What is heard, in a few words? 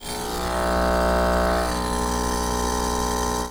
oil diffuser raw